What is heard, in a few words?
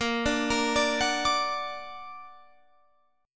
Bb Augmented Bb down Guitar Open F; arpeggiated; JGuitar